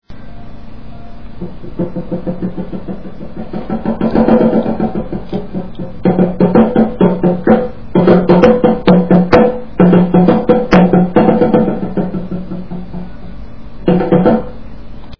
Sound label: tama; Etnologia